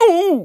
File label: effort; The Wild Thornberrys Movie; BOKO